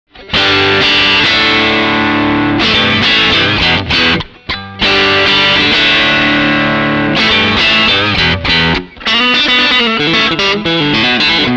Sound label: Tech 21 SansAmp English Muffy Drive Muff off